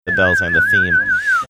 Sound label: making whistling sound correct response